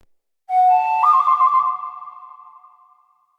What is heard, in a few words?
Animal Flute Call; Player Instrument; Animal Summon